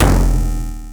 Wavedrum, Korg Radias

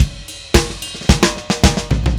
Drums Fill, Indie Rock; Sonic Foundry (Sony Creative Software); Indie Rock Anthems; Session 01 - Brighton

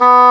WND English Horn; Roland L-CDX-03 Disk 1

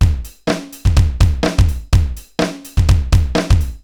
Akai XE8 (125BPM), beats; musicradar; cyborg beats

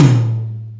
Ambient Rock, Drum Machines

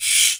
CABASA; Greytsounds VOL-1; LATIN PERC

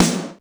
GATE SNARE, DRUMS; East West - Global Sounds

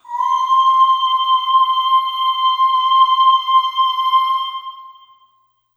Ooh Long C5 Short Reverb; Harmonic Building Blocks

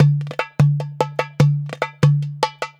DERBUKA, Balkanish Traditions

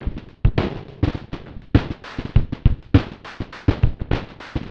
Paper Kit, Drums & Percussion, Electronic; Sonic Foundry (Sony Creative Software) - Electronic Point Blank

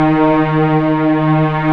STR, Orchestral Strings

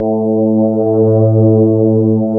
electric pianos; Wizoo Electric Pianos

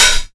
HH OPEN

